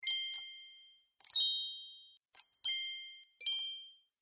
Sparkle Interface Sound Collection, video game sound effects